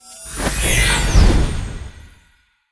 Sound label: Skill buckler; Seer; GamigoNA